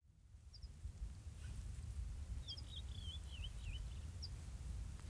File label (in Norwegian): fugl i Steigen